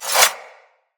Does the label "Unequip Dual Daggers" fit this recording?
Yes